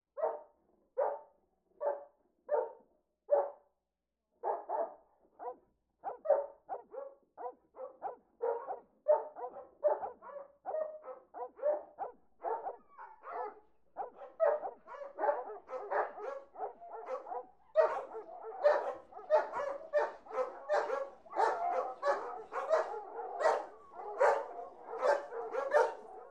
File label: Hunting Dogs; Sound Design